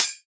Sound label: Dubstep Hihat, Closed; Drums; One Shots; Cymbals